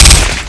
apc fire; gdi

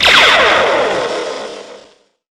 laser rifle fire; UnitXc's SBH pack

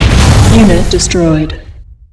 vehicle explosion

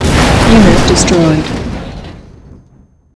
vehicle explosion

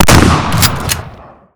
shotfire; Reborn Shotgun skin

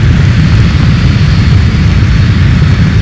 orca loop; orcasounds

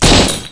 rifle fire; Bren light gun

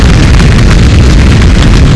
flamethrower fire; flamer; flamer complete

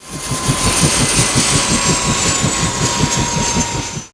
apache engine stop; Urban Camo Apache

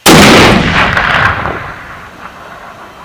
snip fire, 50caliber Sniper Rifle

